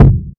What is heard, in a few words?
round kickdrum c sharp key; kick drum samples